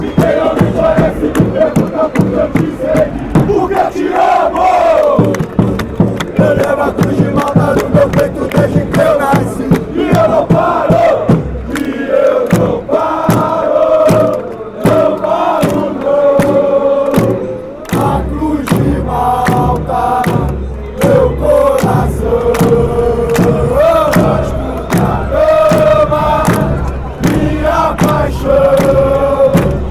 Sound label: vasco da gama chant